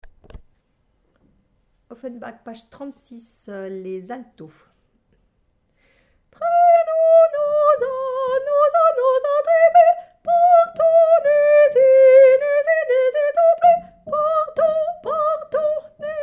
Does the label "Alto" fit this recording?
Yes